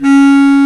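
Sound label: bass clarinet, Orchestral Winds; Roland LCDP04 Orchestral Winds; Lo Clarinets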